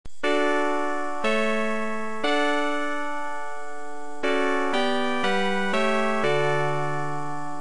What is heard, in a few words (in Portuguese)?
homofonia; musica classica